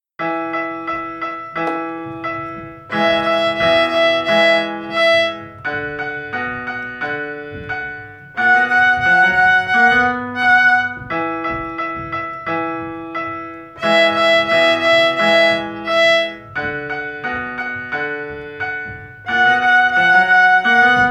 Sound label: elephants and frogs with violin